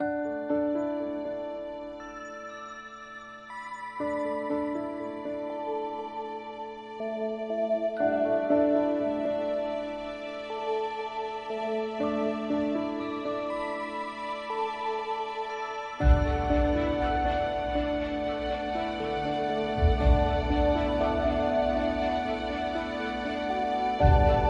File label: Flawless Ambient